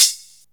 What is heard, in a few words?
damped hi hat sample e key